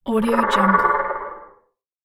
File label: creature voice